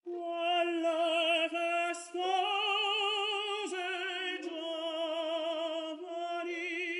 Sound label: Open C1